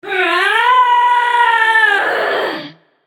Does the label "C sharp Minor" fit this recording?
No